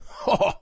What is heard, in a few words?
Victim Miss Male; friday the 13th killer puzzle